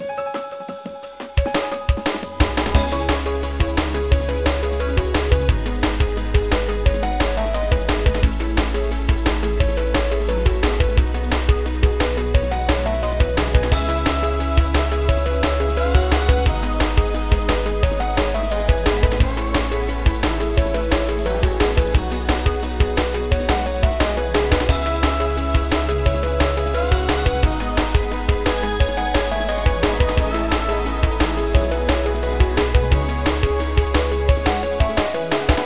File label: Game Combat Ice; backpack hero gamerip 2022